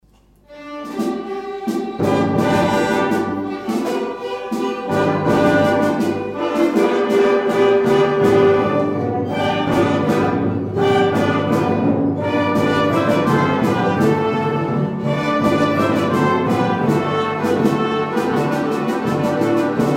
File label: orchestra